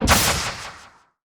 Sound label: multiplier Bump; starburstxxxtremeusa